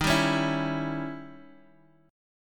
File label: D sharp 7th Flat 9th; strummed; D#7b9; 11,10,11,9,x,x; JGuitar